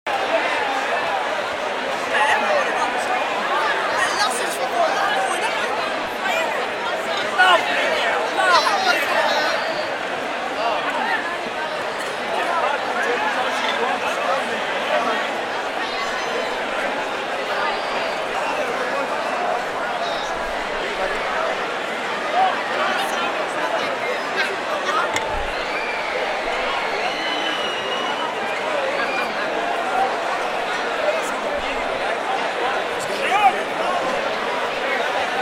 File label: octoberfest beer tent